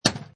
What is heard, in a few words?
Pinball Sound Chunky Clank; Video Games